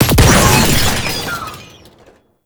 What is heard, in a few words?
Death; Daklo; Warframe; Tau; Betrayer